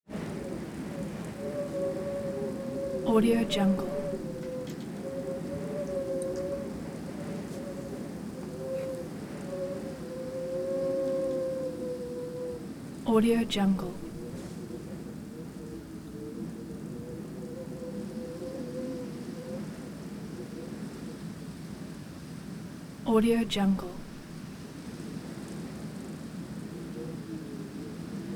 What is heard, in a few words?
wind interior whistley blustering and cold